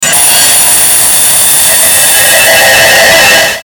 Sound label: static bright; Static Bright Harsh Swell; Production Element Imaging Element Accent Transition; Blastwave